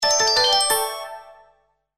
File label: Classical New Chat Message